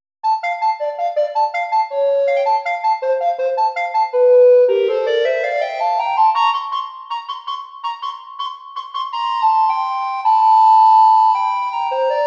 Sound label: AT recorders; Jays Tune